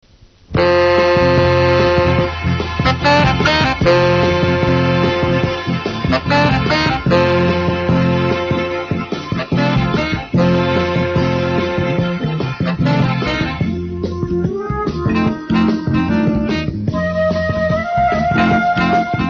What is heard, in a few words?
flute; 東芝音楽工業